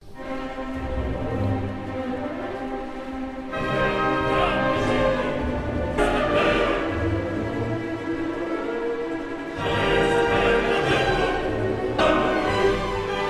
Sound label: Orchestra Chorus, Dramma per musica; Daniele Gatti; Bologna Teatro Comunale; Armida